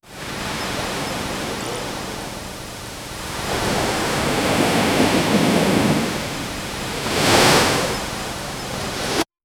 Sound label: ocean waves crashing on, cave